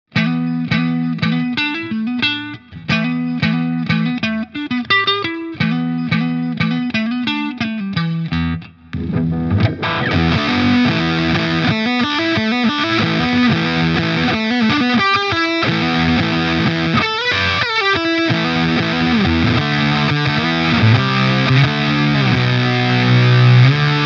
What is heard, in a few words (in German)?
Fuzz heftige Verzerrung